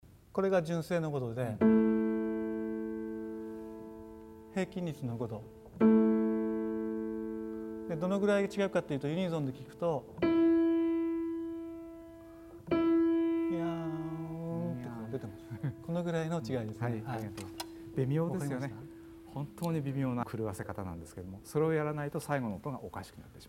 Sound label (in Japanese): 純正と平均律5度